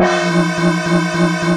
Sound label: Gong; Fairlight CMI IIe; Cymbals